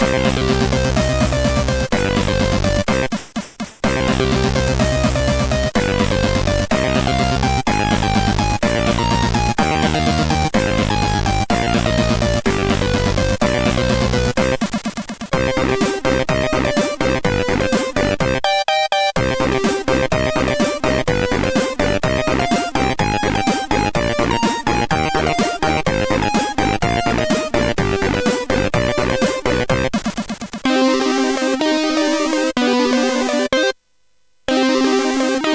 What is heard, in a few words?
Fanfare, CSG8580R5